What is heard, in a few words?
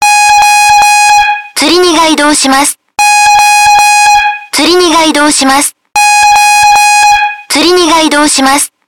beeps; A type